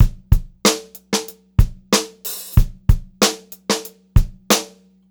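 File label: STRAIGHT BEAT, Funk-Groove Drumloops; USB Soundscan vol.38; AKAI; 90 s Sample CDs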